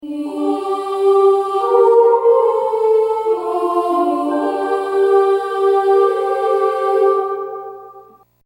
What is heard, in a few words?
Wii Menu Jingle; Metroid Prime 3: Corruption